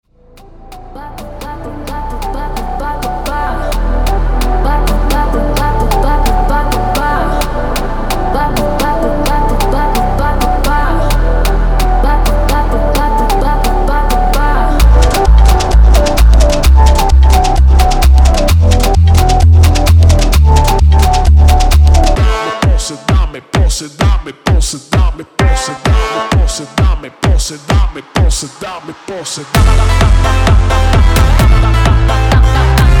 Brazilian Funk; Function Loops; SAMPLE PACK COLLECTION VOL.7

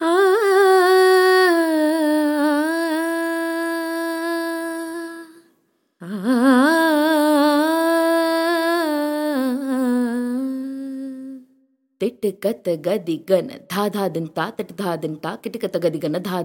Classical Indian Vocals; 印度古典人声; 印度声乐风格; Noiiz; 《古典印度声乐》收录了印度乐句、即兴演奏和节奏; 坦（一种高超的演唱技巧）、传统的塔拉声乐节奏、古典拉格即兴演奏和乐句; Psytrance; 世界音乐

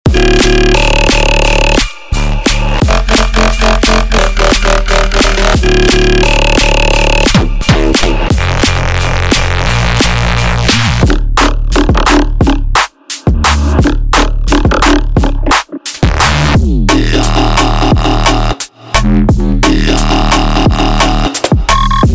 full mix, Drum Bass & Jump Up; Siik Sounds; Taurus Full Pack

